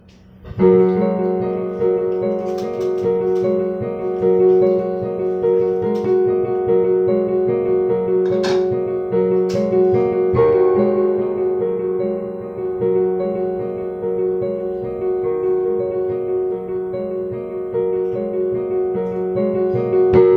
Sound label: lofi live; pentatonics feat kitchen noises; weeklybeats-2020